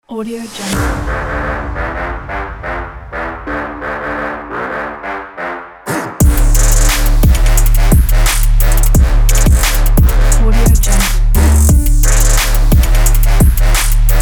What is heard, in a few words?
action urban trap